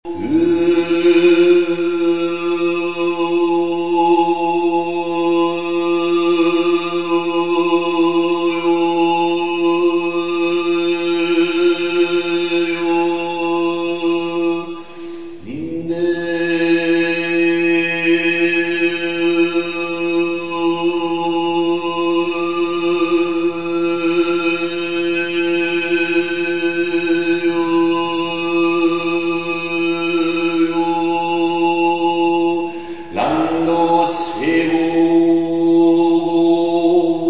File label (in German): Mundraummelodie, obertonschule